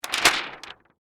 Cellophane Move, Foley, Gel, Movement, Cellophane, Color, Correction; Crackling